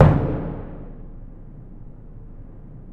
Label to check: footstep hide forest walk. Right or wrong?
Wrong